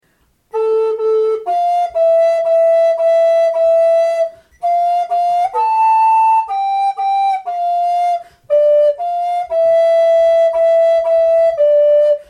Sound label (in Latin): personent, FLUTE